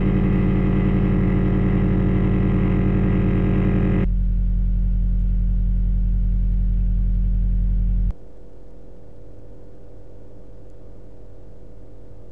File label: structure borne air borne with sound bridge